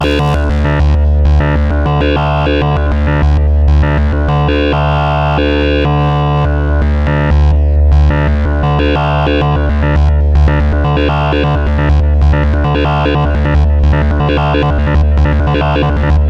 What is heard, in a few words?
robot, access virus; synth